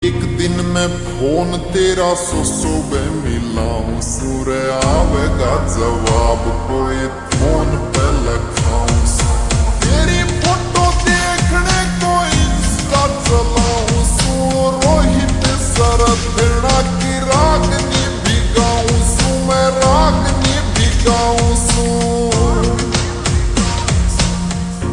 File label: Ringtone Slowed Reverb